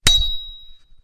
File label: Metallic Weapon Low